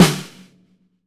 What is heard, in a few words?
12 SNARE, MAPLE SNARE